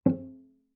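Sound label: Cello pizz fortissimo sul C C2; MIS Pitches - 2014; Strings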